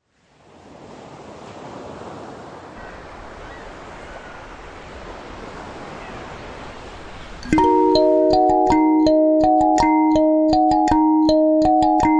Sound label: theme on kalimba; Game Of Thrones